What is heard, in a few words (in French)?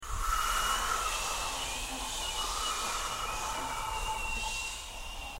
chien eleves